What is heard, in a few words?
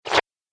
App Game - Sticky Splat; Splat Sound Effects